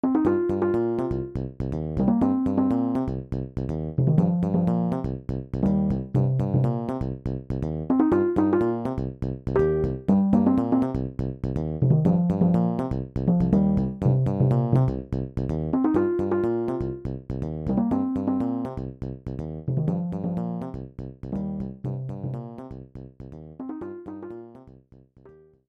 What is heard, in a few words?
Roland SC-88; SimCity 2000